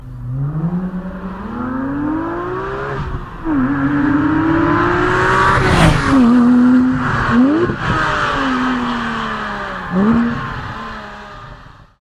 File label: porsche passing by